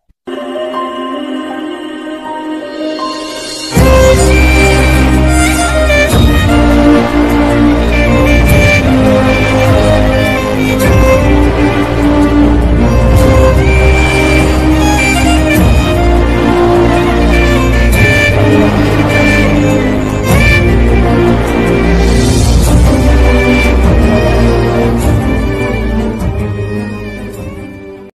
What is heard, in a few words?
ringtone background music; tiktok trending songs ringtone; tiktok reels ringtone